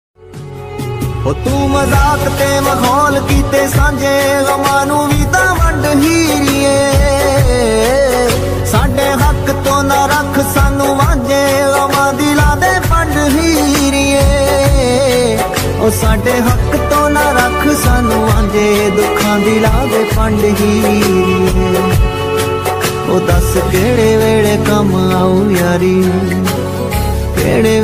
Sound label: ringtone punjabi, song ringtone, punjabi ringtone